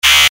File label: error; en-pocas-palabras